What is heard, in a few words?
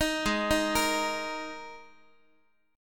Gsharp Suspended 2nd Gsharp Guitar; arpeggiated; x,x,6,6,7,9; 4A,4E,5A,5D,5G#,6C#